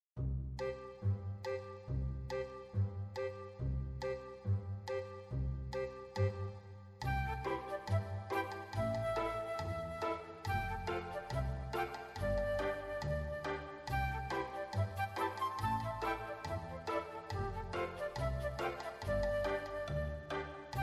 background music

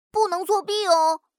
click

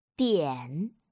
stroke

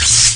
shot

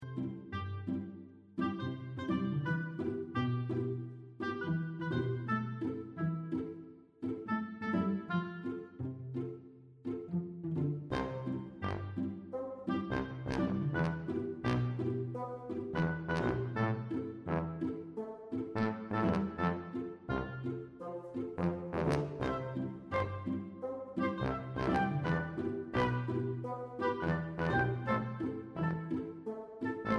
background music

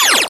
shot

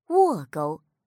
stroke

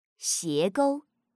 stroke

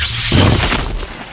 hit

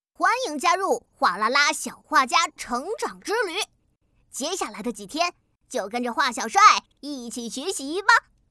opening music

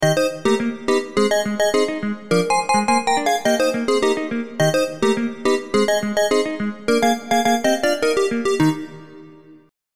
doll bgm; dollCatcher

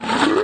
hit

